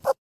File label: rabbit hurt; Minetest; VoxeLibre; mobs mc